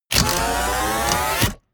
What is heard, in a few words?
wind up